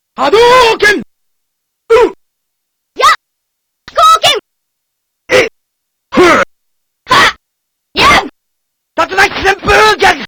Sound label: Pocket Operator PO-133; Teenage Engineering